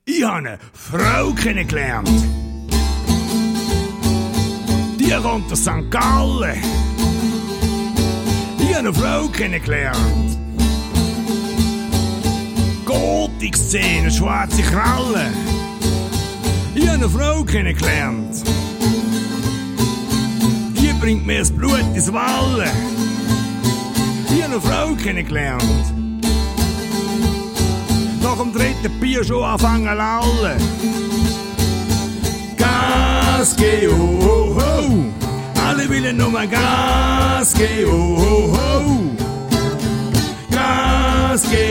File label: delta blues